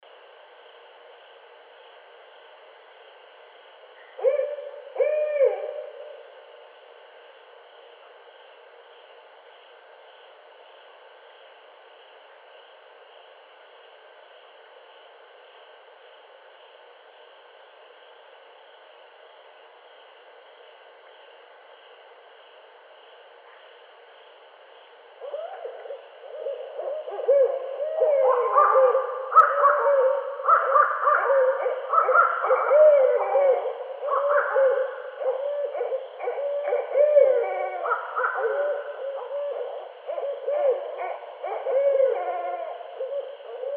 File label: Barred Owl